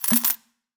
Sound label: ui room alchemylab potion buy